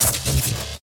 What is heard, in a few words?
Sci-Fi Mechanism; Sci-Fi Weapons; Source Sounds